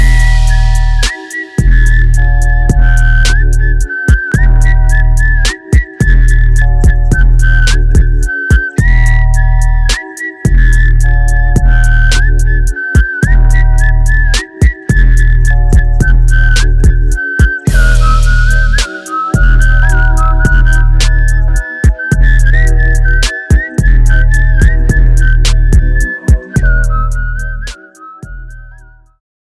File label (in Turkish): Trap Remix, Zil Sesi; Kill Bill